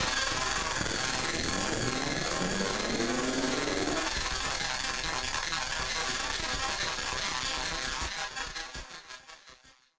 Battered Synth; malfunctioning synth samples

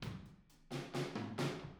Fill, Room, Drums; Sonic Foundry (Sony Creative Software); Drums from the Big Room The Mixes; Demo Projects

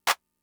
Clap, Fricke MFB-522, Drum Machines; 300 Drum Machines

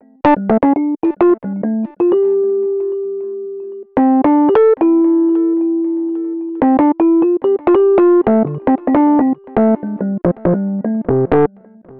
Rhodes Solo, Cinematic Funk; Cinematic Funk Urban Tone Poems; Xotica

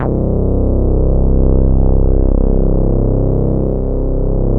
ARP BASS; AKAI S6000 CD-ROM - Ultimate

